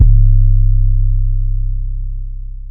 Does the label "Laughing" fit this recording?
No